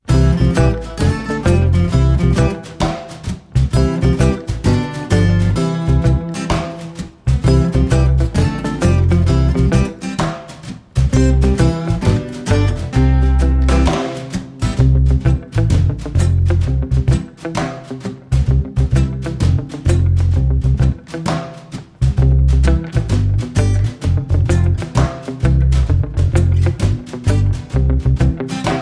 mein hande instrumental

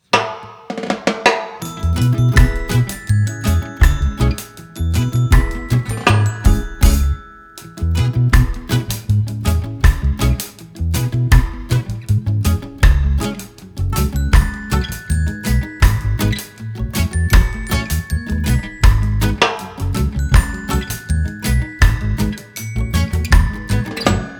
infinitive song instrumental

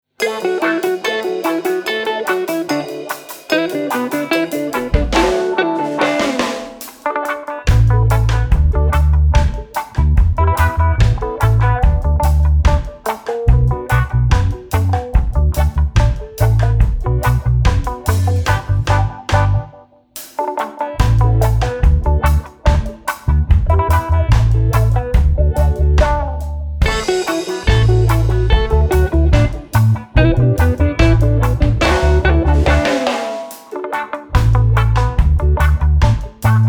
banana lied extra verse instrumental; German with Felix and Franzi I - Volume 1; Goethe-Institut United Kingdom

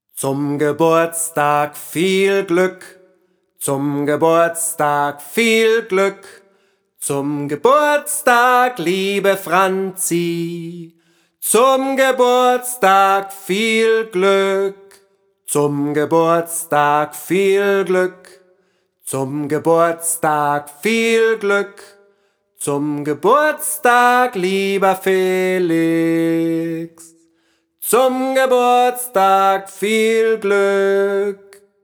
happy birthday acapella

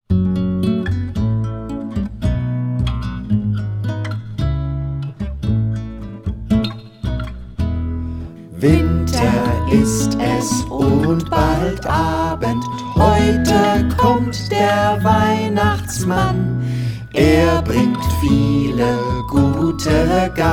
xmas song all voices

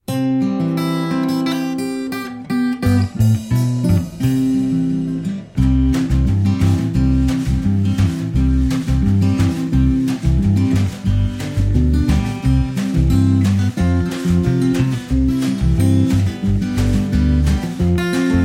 instrumental

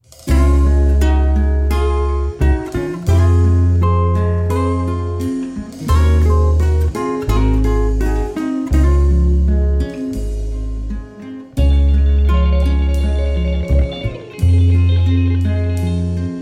rainbow song instrumental

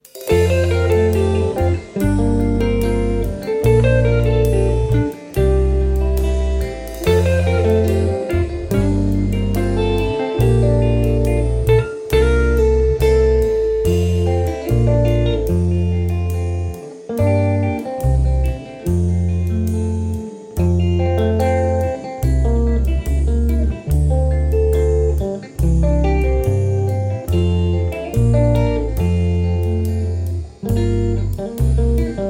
bienchen instrumental

